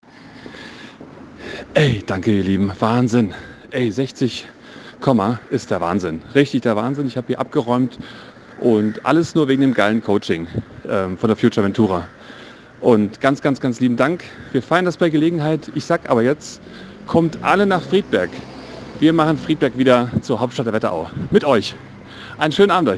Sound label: feedback